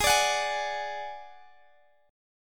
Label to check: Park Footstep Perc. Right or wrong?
Wrong